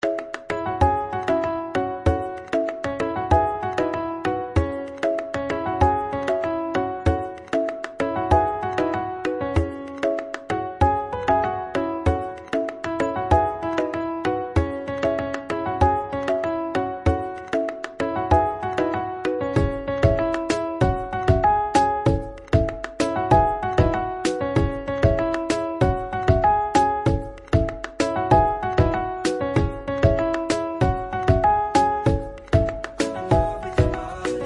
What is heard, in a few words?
instrumental, ringtone